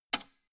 old switch harsh snap hard

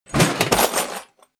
toolbox handling rough rattle

